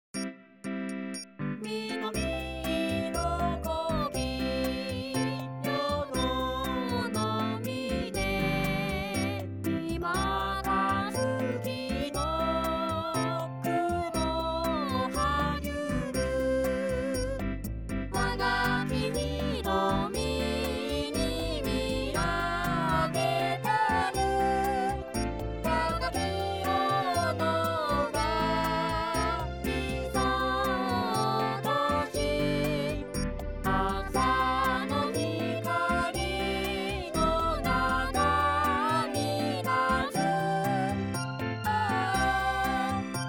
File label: chorus; hiyoshi